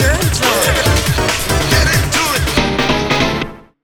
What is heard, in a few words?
Map Win; Buster Jam; MSX2